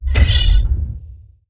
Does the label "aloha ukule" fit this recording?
No